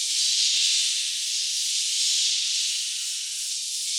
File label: Noise Loop, sparse soundscape